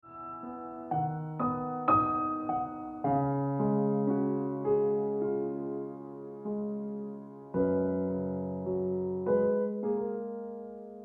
Ringtone Piano Music

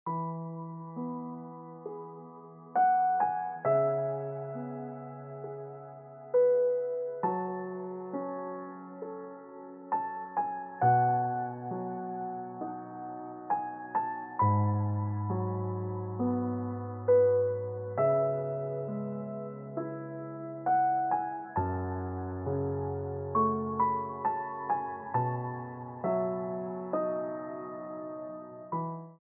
Ringtone Piano Music

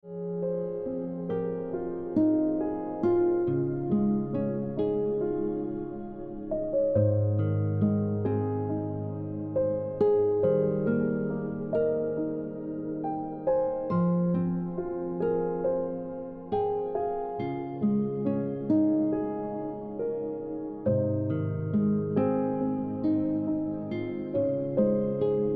Ringtone Piano Music

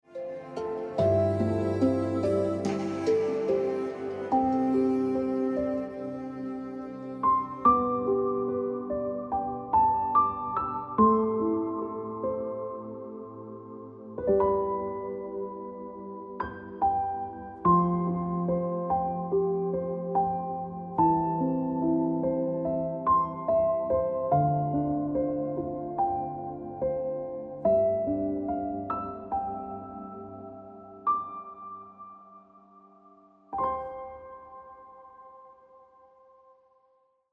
Ringtone Piano Music

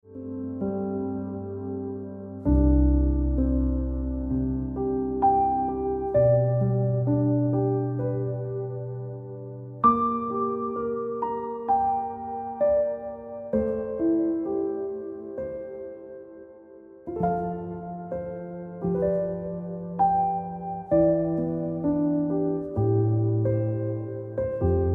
Ringtone Piano Music

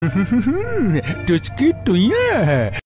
Notification Tone Ringtone

